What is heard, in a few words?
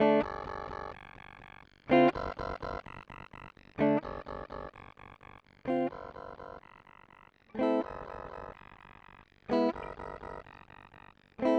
Meris Polymoon Early Modulation